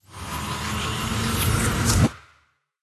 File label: Knockback Charge; Linirea Mega Knight